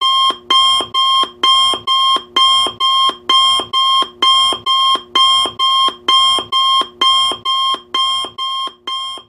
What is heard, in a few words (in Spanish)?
notificacion alarma